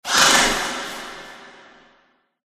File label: bat block